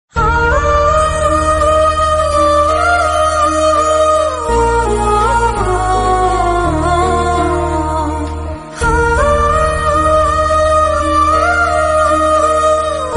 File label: holi songs kannada